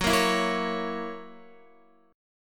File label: Gb 7th Gb down Guitar, chord; strummed; JGuitar